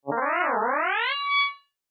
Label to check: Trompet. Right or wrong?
Right